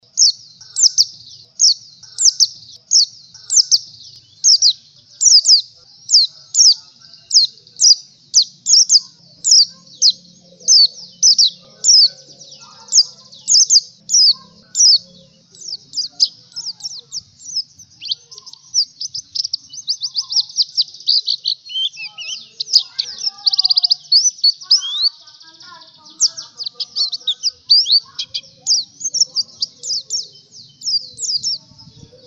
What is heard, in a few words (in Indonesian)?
Suara Burung Pleci Gacor Ngerol Panjang